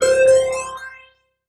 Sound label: Multimedia Internet CD-Rom Flash Beep Mechanical